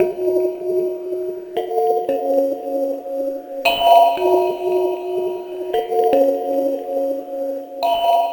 Otherworld Chime; Sonic Foundry (Sony Creative Software); Loop Noir; Session 12 - Otherworld Journey

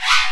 Cricket C4; Digital Sound Factory Xtreme Lead

